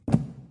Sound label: book drop